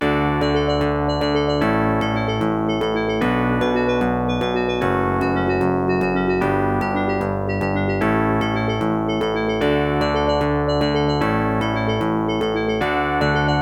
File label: ESS ES688; Lemmings Level 1